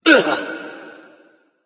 grunt hurt; smite; thanatos

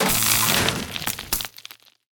kappa death build; smite; kuzenbo

